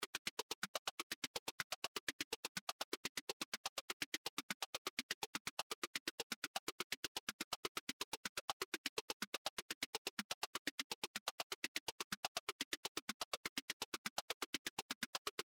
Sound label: lfo